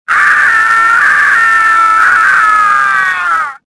falling, worker; worker2

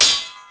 sabre hit; Pirates Of The Caribbean; DUEL

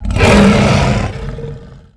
rancor hit; Star Wars Knights Of The Old Republic I; XBOX

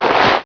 leather jacket running left; characters

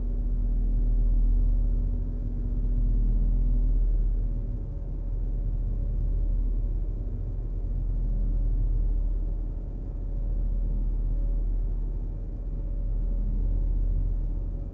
landing ship bay ambience; Star Wars Obi-Wan; XBOX

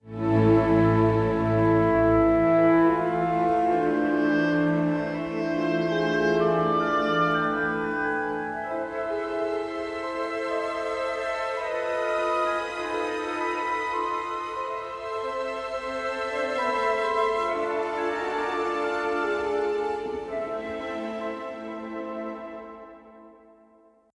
intro, music flourish

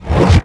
switch skater; Tony Hawk's Project 8; XBOX; bedroom

